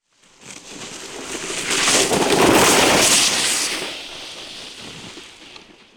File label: snow samples stepping and crushing, field recording